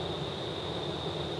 Rotate

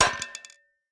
Break Thrown Weapon Sweetener

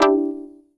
Fail; rat on the run android ios windows gamerip 2009

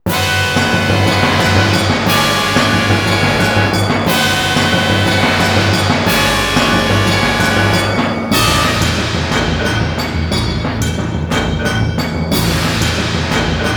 Boss Battle

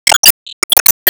Enemy Ferriswheel Active Move

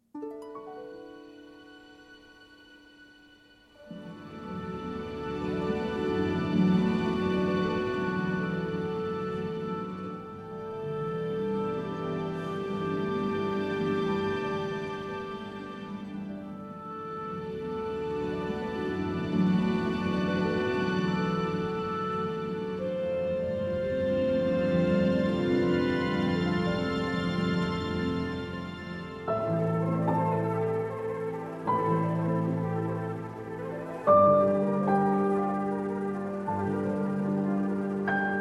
Village Rain; Disney Dreamlight Valley; Xbox

